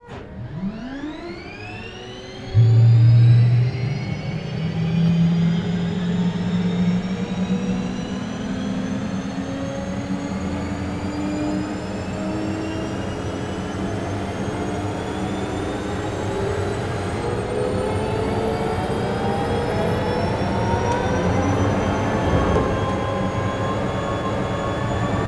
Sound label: M1 Engine Startup